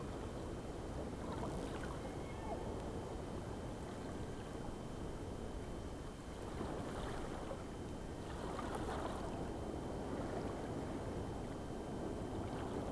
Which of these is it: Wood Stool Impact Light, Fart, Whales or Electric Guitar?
Whales